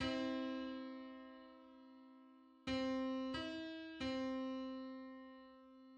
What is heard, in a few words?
Three-hundred-fifteenth harmonic on C